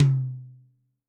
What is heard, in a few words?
Maple, Yamaha DTXpress-II Tom